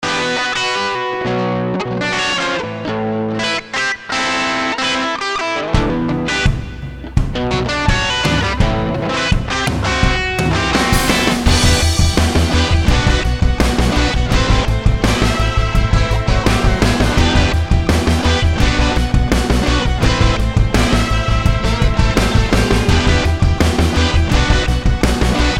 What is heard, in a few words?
Layered Rock Guitar Riffs